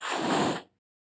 Cat hissing

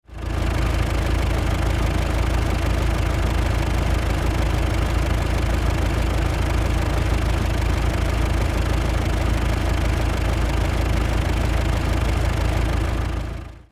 Excavator engine idle construction vehicle loop

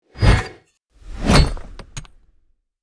Pickaxe launch and hit

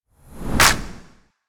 Cinematic rise and clap

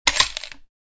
Mauser 98 sniper rifle reload

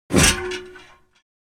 Paint can picking up

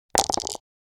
Dice shaking closed plastic cup

